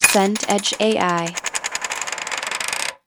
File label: coin spin